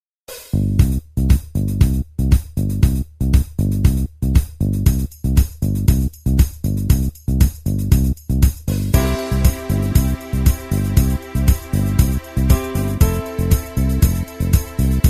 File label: Discosamba, KORG Styles